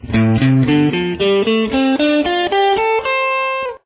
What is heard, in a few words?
c scale pattern example, guitar